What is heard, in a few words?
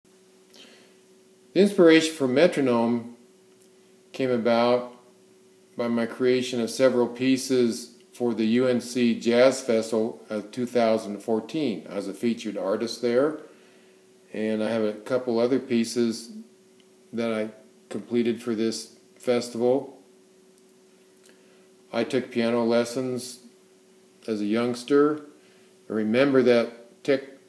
Metronome